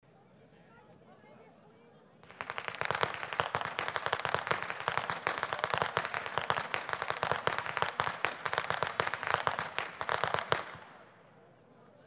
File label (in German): schnalzen, jugend; waging